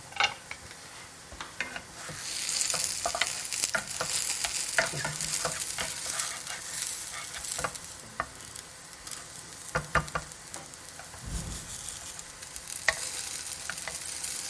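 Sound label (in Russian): приготовление пищи на сковороде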